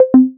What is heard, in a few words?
notif